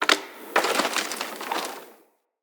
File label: Tree Pine Branch Break; Trees; Midrath Island; Ambience